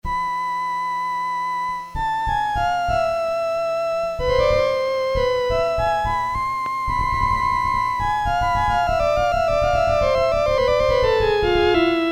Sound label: violin, Casio SK-1; Harmonic component sound